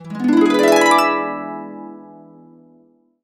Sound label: E, Harps; Complete Harps; USB Soundscan vol.62; AKAI